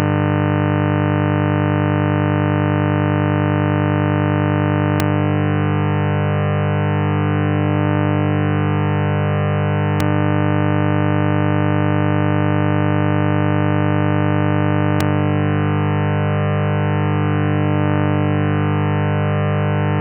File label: fifths